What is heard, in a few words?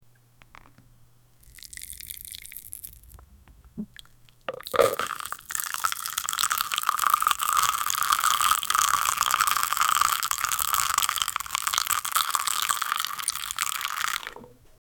long sound of liquid bein